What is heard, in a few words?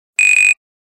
Buzzer, phonetones Nokia N82